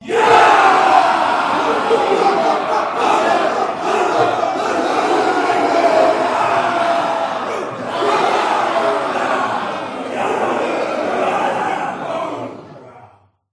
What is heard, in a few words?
medium crowd of warriors yelling battle cries 100 people; Sound Ex Machina